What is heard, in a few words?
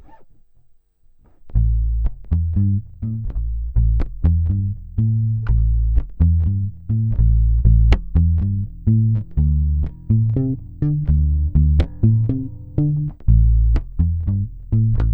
bass; squier; classic vibes